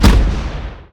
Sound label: Rocket tower attack